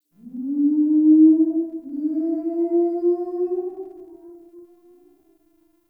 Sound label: Exterior Distant Calls Happy Calm; Ambience; Duviri